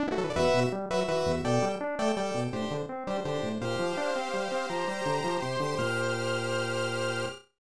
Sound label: Victory; Romance of the Three Kingdoms; Sangokushi 3; SNES